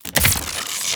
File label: Shotgun Reload; Weapons; Warframe; Tenno; Revenant Shotgun